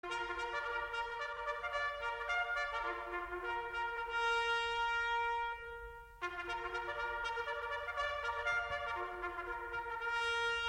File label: USMC Band Marine Hymn